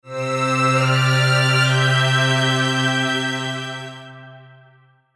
Strings Poly Divisi

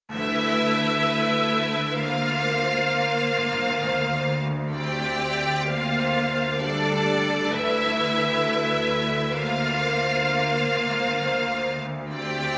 Strings

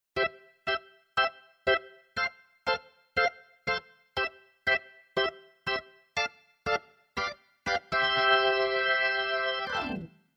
Keyboard Organ Reggae Organ

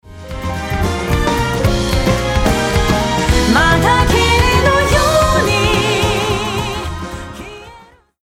Bass Auto On

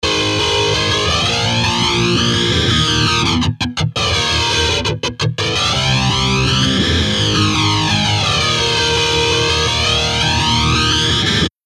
Chorus Flanger Case 2 After

